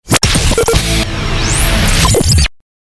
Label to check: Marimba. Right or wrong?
Wrong